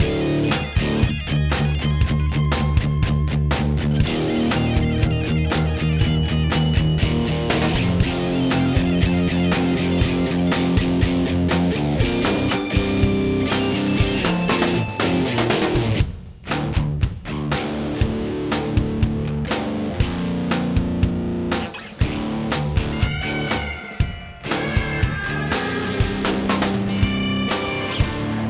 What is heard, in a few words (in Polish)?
Rocktron Pro Gap Bez Symulacji Prosto W Linie; Rocktron Pro Gap Professional preamp